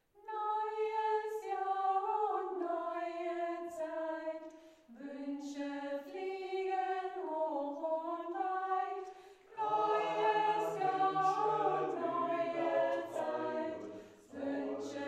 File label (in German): Kanon gospel Chor